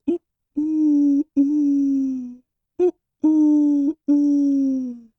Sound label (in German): Eule; Radio AG